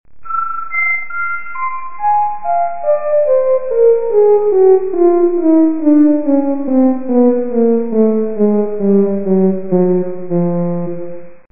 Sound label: trautonium; syntezatory